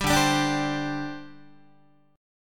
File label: D 7th Suspended 4th, down, Guitar; strummed; JGuitar